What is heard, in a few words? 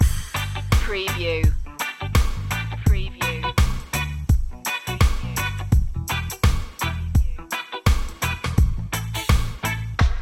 sunny reggae loop